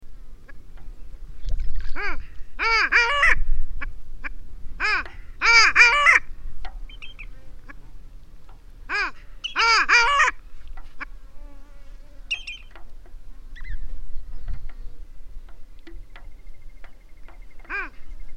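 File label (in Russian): moryanka golos, long-tailed duck voice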